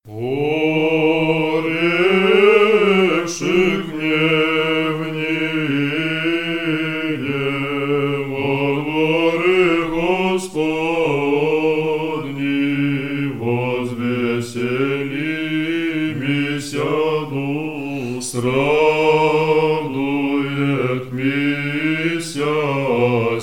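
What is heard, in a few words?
third antiphon tone 1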